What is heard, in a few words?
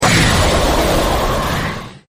skill loop; kaze